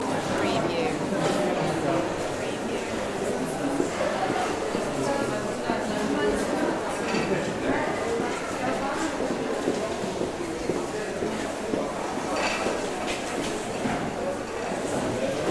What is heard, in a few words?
furniture store ambience; loop